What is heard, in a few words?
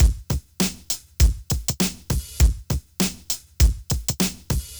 Loop